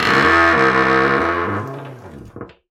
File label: Door Creak